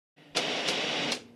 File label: Tv Switching Sound